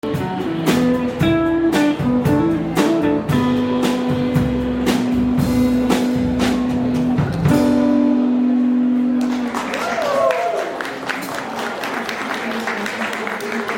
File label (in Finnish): musiikki